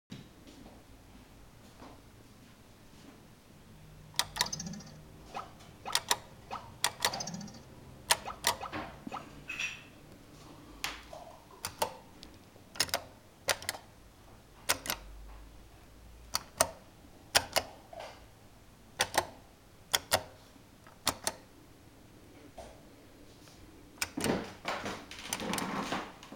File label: slot machine